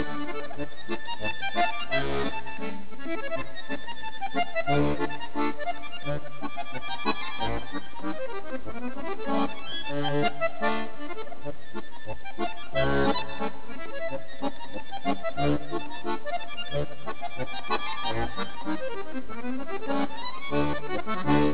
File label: italian, accordions